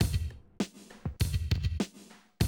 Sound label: drum loop, 100 BPM; Best Service; XXL 1500 DrumLoops